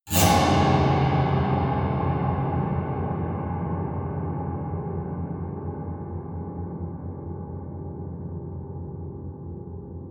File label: piano twang multiple fast medium